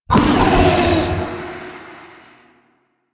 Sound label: Paralyzing Screech Loop End; Warframe; The New War; Archon; Boreal; Abilities